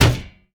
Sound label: bang